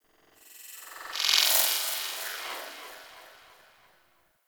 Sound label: Tech Texture Small; Warframe; Ambience; Cephalon Weave